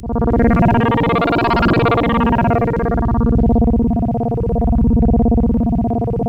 A#, Synclav FM; Big Fish Audio; SPACE1

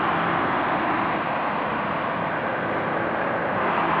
sparse soundscape samples; ReLooped

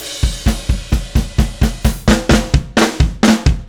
Drums Outro Fill, Indie Rock; Sonic Foundry (Sony Creative Software); Indie Rock Anthems; Session 10 - Roswell

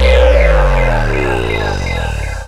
DM BAS, Dance Mega Synth

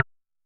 Perc Wood Low, Vermona Mono15 Drums

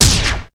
HIT, STABS, House

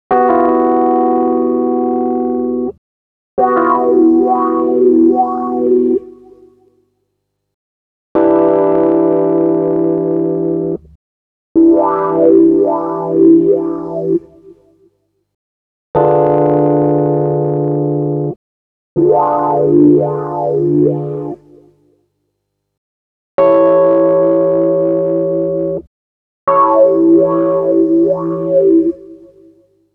breaks, Jungle; Zero-G Jungle Frenzy 2

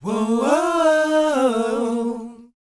WHOA A#, Vocal R&B & Dance; ILIO; Vocal Planet

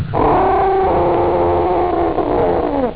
cry; wrath; Amiga Soundtracker Sample Packs

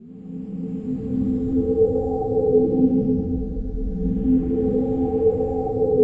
PAD, DIGI-PADS

